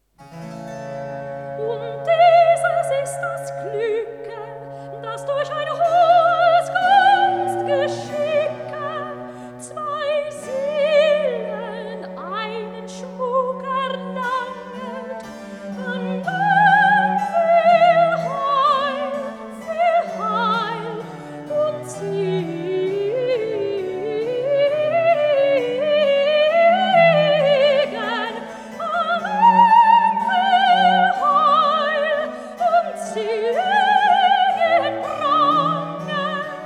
Wedding Cantata - Recitativo; Und dieses ist das Glücke; Baroque; J.S. Bach; Secular Cantatas; BWV 202

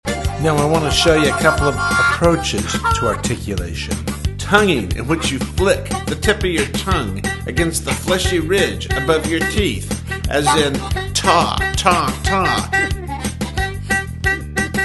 Ta Ta Tongueing, harmonica

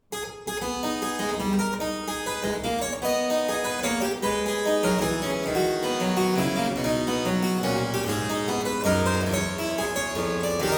Suite in A minor - Courante, harpsichord; Buxtehude; Complete Harpsichord Music

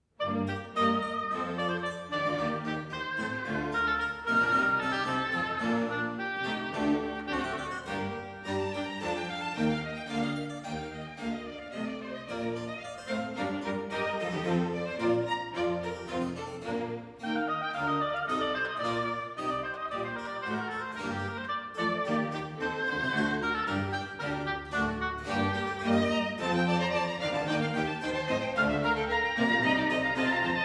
Oboe Concerto in G minor - Allegro; Handel; Oboe Concerto No. 3; HWV 287

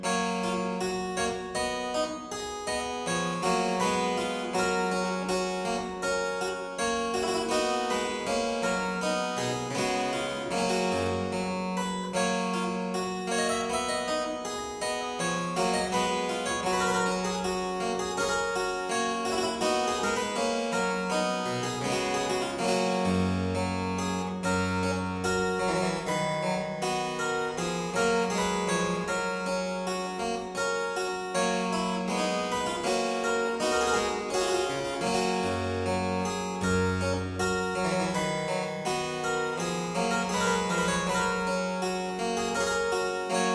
Aria in G major Partita 23, Harpsichord

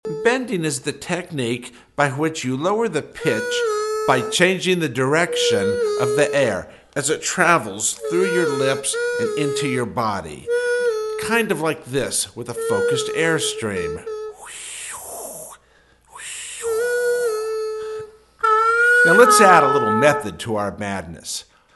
Bending, harmonica

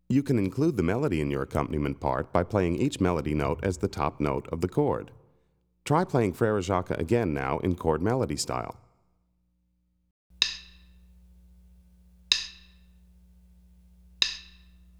Chord Melody, harmonica; Play Harmonica

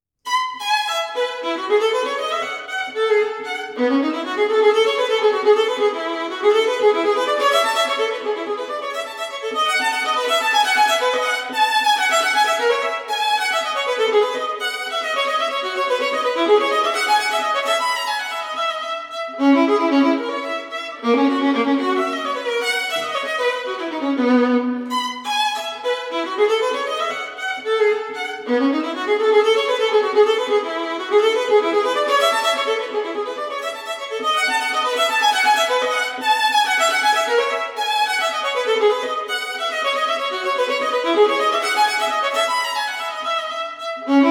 Partita in E major - Gigue, Solo Violin; Baroque; J.S. Bach; Sonatas And Partitas For Solo Violin; BWV 1006

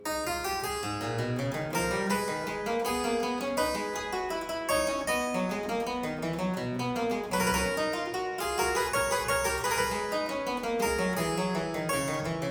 Courante in A minor - Variatio, harpsichord; Buxtehude; Complete Harpsichord Music; Courante zimble; BuxWV245

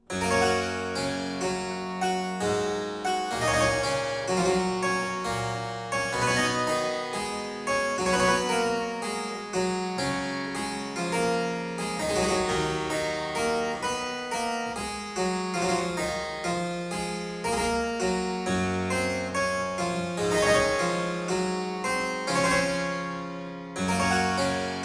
Suite in G major Sarabande, Harpsichord; Buxtehude; Complete Harpsichord Music; BuxWV240

